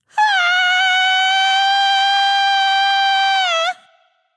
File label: fear; Smite; awilix